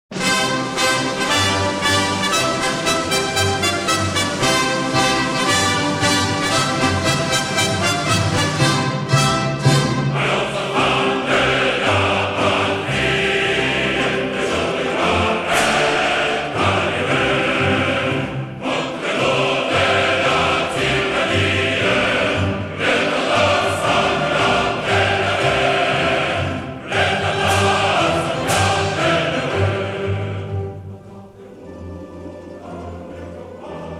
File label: Choir, The French National Anthem